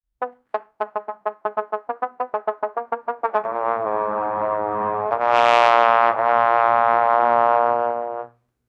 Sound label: distant trumpet play muff